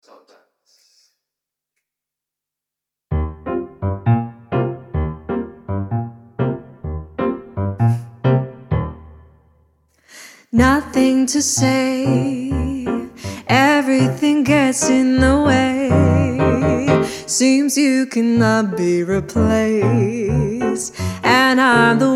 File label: Jazz Cover